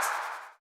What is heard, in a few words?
Erase